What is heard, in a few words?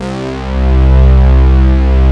FAT SAW PAD; Pro-Rec - Synthsonic